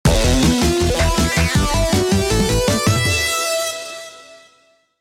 Vibrant 80s Logos Aggressive Logo with Drums and Synth; Folia Soundstudio